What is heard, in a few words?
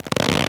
Leather